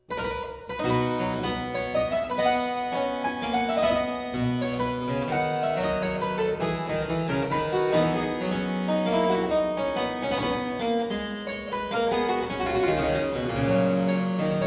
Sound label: Suite in C major - Courante, harpsichord; Buxtehude; Complete Harpsichord Music